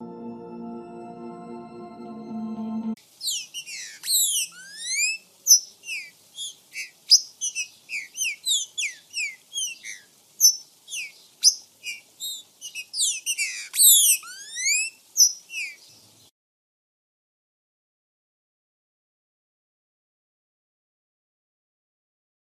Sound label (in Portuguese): CANTO TRINCA FERRO